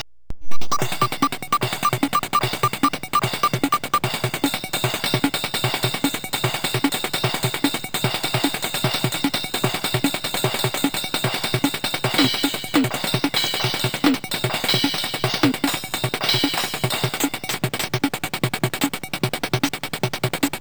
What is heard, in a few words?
concerto